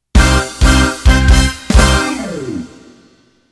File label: Chalypso End